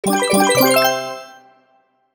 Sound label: win, slot machine